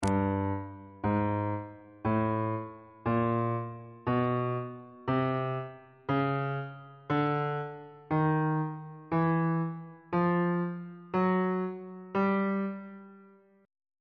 g ionian mode chromatic scale 1 octave on bass clef